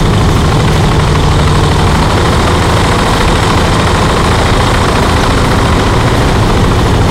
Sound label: panzer3 engine int rpm 66 load, tanks; engines; War Thunder